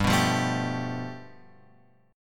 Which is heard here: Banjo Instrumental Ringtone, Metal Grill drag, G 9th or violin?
G 9th